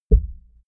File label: heart beat single high; comedy-cartoon; koerpergeraeusche